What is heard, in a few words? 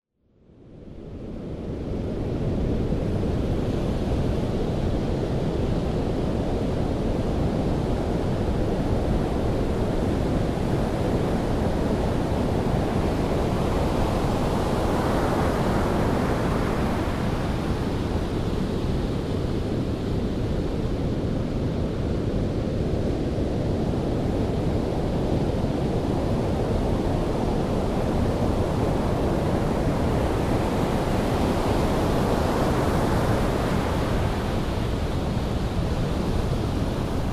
surf